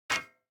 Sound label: DICE on Metal, Grab, Standard, 3 Three Dice; Samples; ArticulatedSounds; DICE Sfx Party (Extended)